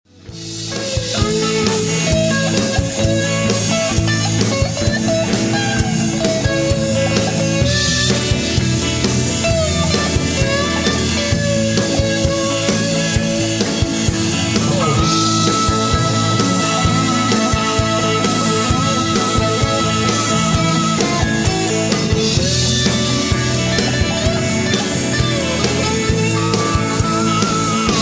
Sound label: solo